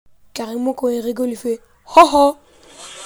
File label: bahaha